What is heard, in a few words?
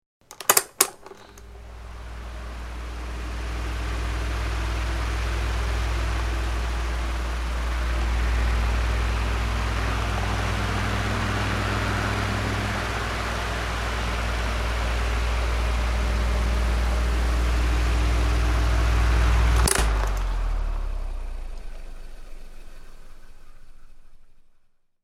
Desk top electric fan switched on and then off; Electronics